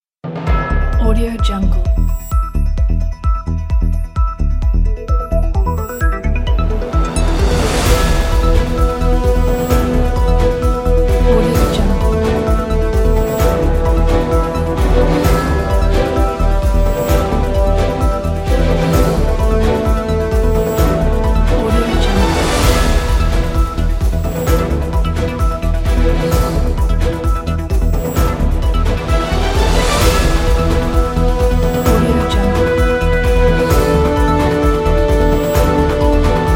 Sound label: news music